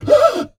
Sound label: Classical Guitar Extended Technique